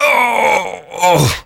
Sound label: death; dukenukem